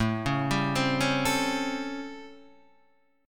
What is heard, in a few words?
A 7th Sharp 9th; A7#9 Chord {5 4 5 5 2 5} arpeggiated